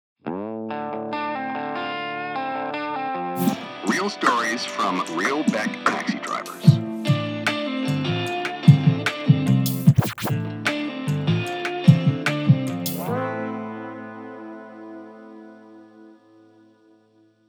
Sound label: Intro Music Radio Vox